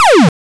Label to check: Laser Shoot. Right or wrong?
Right